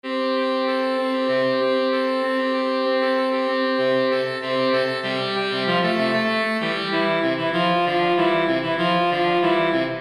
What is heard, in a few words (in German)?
saxophonquintett